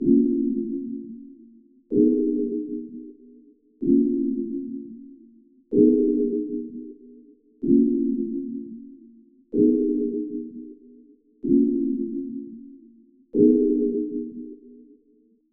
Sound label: 126 BPM D# Min Pad; Trap; Loop Stems; Cymatics; 2022 Melody Collection; Trap Pt 1; Cold Feet